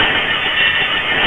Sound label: skid metal, cars; ultimatestunts